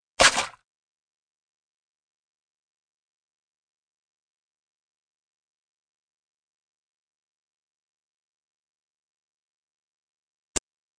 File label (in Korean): Fights, 싸움-전투; 헐리웃특수효과음